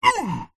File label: human male vocalisation hit pain short